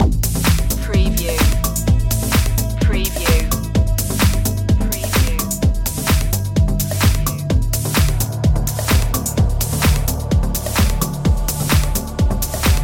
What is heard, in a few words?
progressive